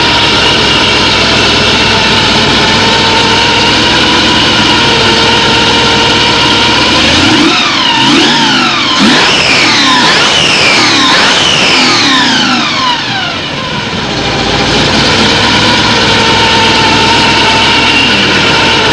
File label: revin